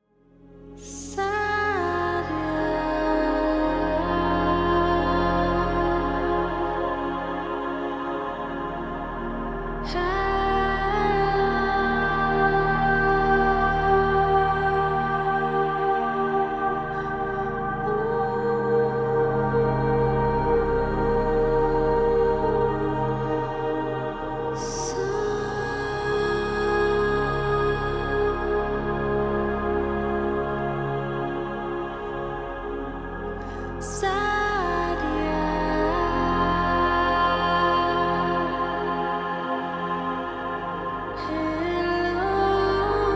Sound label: Vocalversion